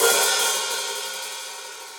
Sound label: Hihat Open Shank Low Loud Squashed; Drum Hits; Standard Hits; Ableton; Factory Packs; Session Drums Club